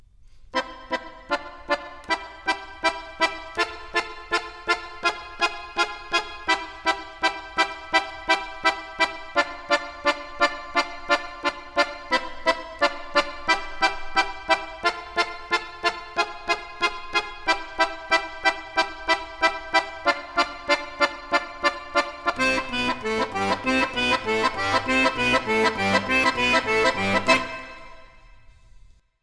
Accordion, Jazz for piano

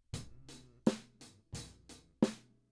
Drums

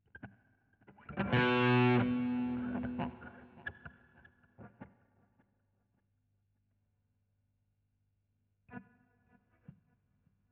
GTR B Solo

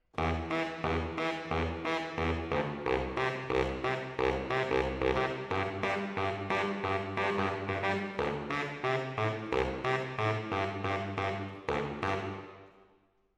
MKH AB, piano

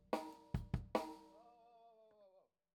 KIT

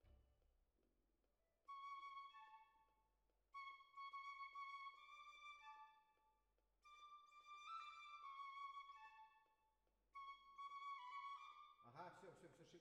Cellos Over

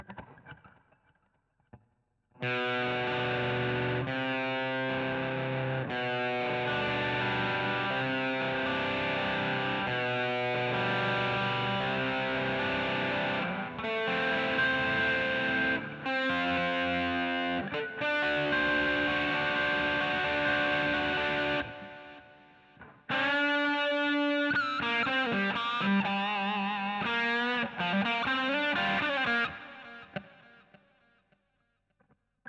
GTR A Solo

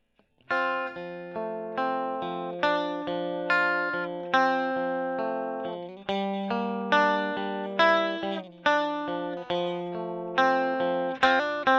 E. GTR Gefell

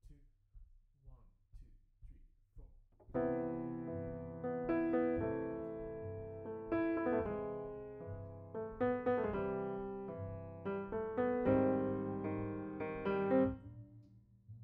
Piano B

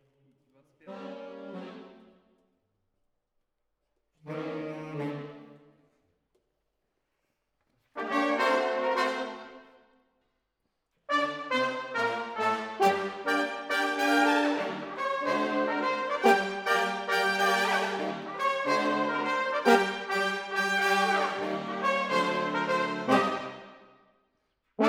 Horns Over

